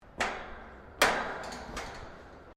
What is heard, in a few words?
METAL IMPACT CLANK; Metal Sound Effects; Metal (Sound Effects:Materials)